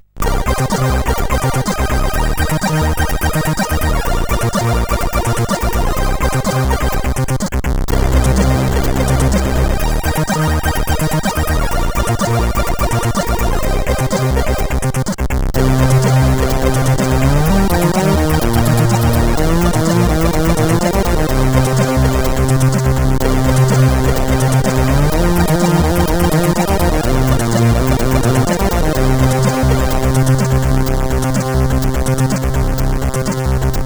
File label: Overdrive sid CSG8580R5